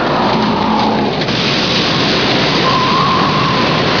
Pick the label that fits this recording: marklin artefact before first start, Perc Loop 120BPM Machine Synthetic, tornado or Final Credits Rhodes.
tornado